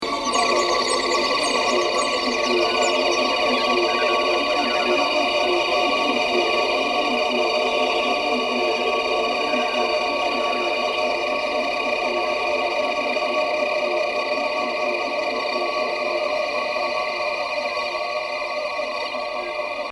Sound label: expression drone